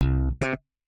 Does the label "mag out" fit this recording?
No